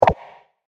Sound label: (SFX) Button Press